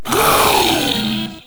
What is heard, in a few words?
Proto Infested Ancient Vocal Pain; Warframe